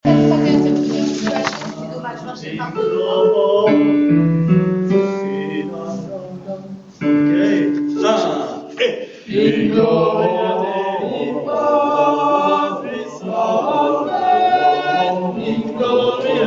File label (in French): basses ténors, travail vocal